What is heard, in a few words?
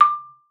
MARM D6, MARIMBA; Xsample VOL-4